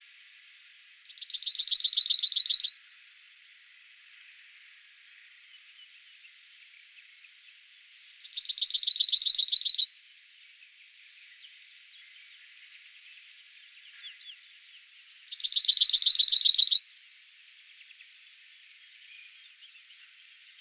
call, cirl bunting, Emberiza cirlus, This cut is part of a very long song bout, where the bird sings two different song types